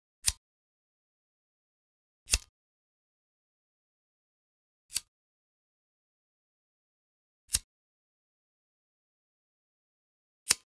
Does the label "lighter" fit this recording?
Yes